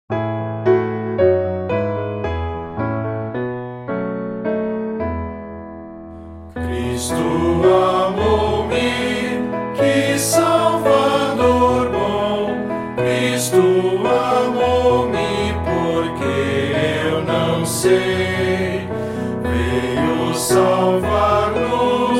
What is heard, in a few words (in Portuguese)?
voz piano